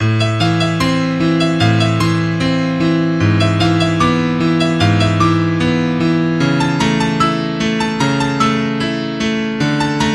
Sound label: piano